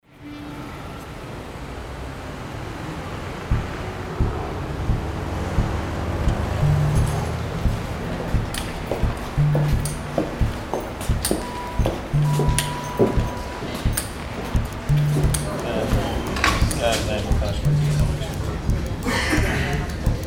hospital ambiences

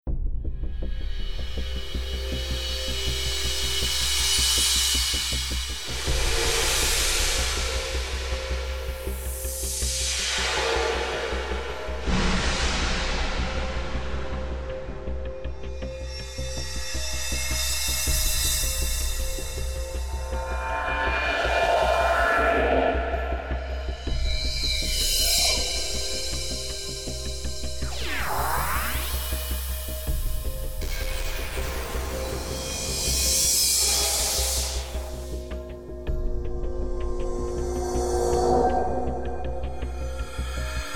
Swell

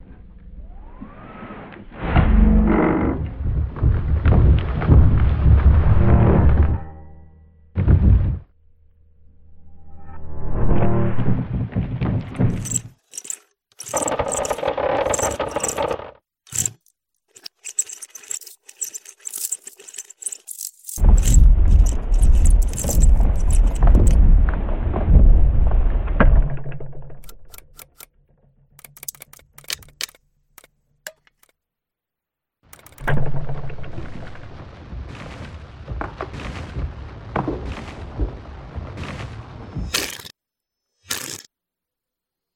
antique accordion

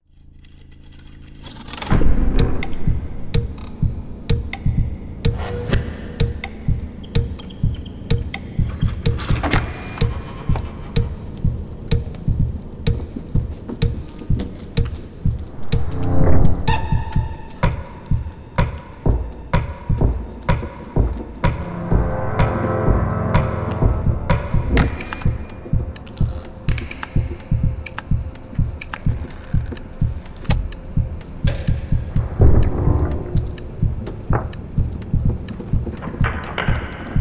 antique accordion

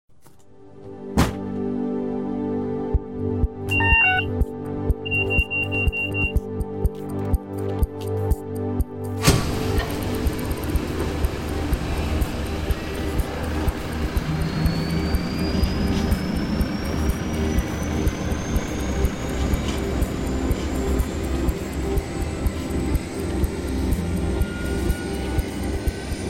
utility helicopters

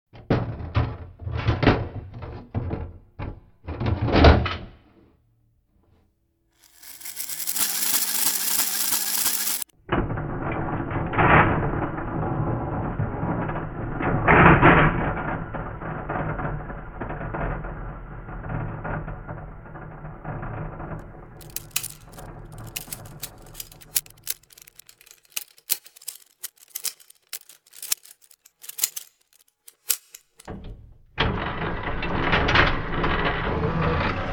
protectograph bent

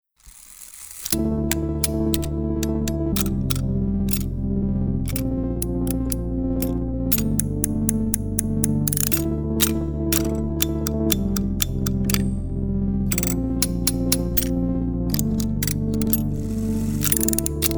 Clink

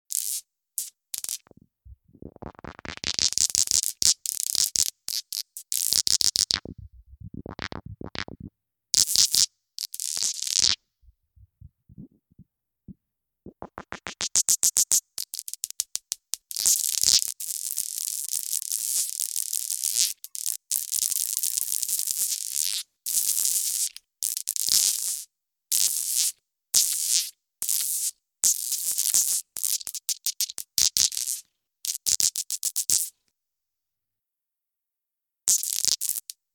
micro magnetic

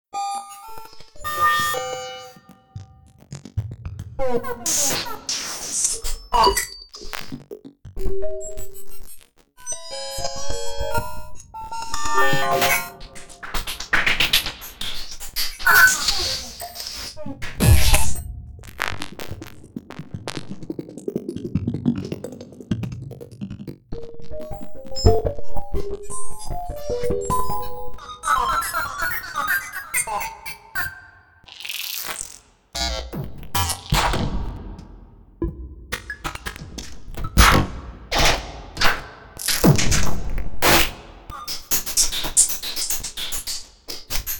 malfunction

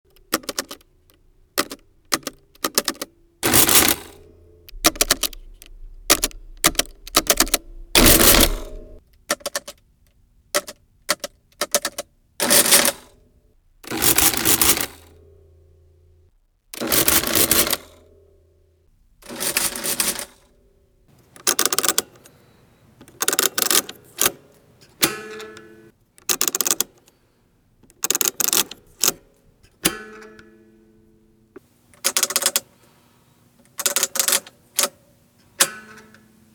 Remington Rand adding machine

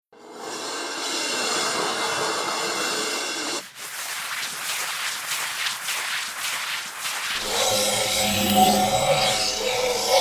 motion textures raw

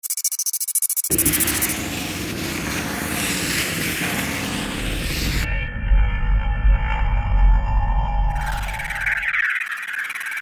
Motion Textures; Design Demo

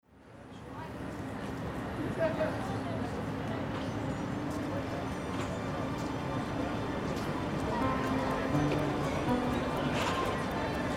pro hockey ambiences

